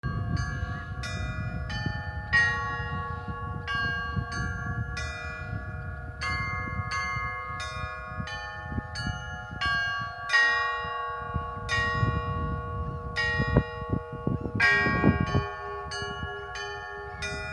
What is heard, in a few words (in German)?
Glockenspiel Kapelle